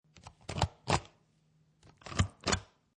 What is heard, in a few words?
Rubber Stamp Twice, Office stamp, stamping paper twice; Classroom Sound Effects; Schools; Lynne Music